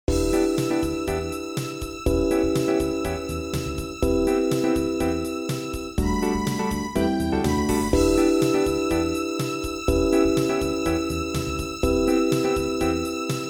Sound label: Groove